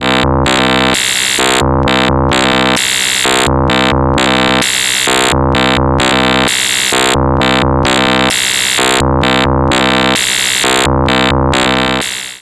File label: Waverazor Dual Oscillator FM Sine OSC CV In; MOK